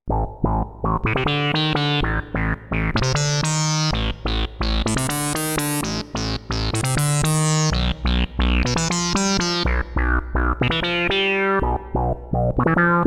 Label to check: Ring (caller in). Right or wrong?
Wrong